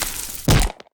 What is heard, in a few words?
Halloween Pumpkin Attack; Eft